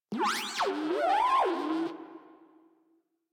Moa Compassionate Vocal Greeting Excited; Warframe; Pets

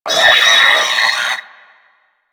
Sentient Brachiolyst Death

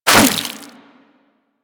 Inf Boomerang Fast Whoosh E; Warframe; Weapons; Infested

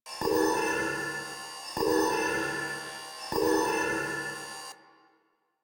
Computer Beep Loop; Ambience; Warframe; Grineer Galleon

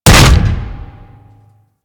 Hammer Impact Flesh B; Warframe; Weapons

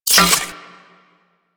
Reload, Weapons; Kuva Zarr; Cannon

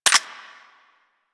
Tranquillizer Rifle Mech; Warframe; Tenno

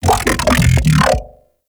Ambient Vocal; Nechro Mech

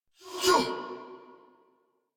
Attack Effort; Warframe; Dax Ashigaru; en

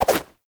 Run Whoosh; Enemies; Foley; Footsteps; Warframe; Lasrian Soldiers; Lasrian Butcher

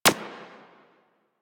Decoy Counter Measure Shot

